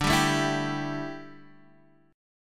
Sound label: D Suspended 2nd Suspended 4th, Guitar, Standard; strummed; x,5,5,2,5,3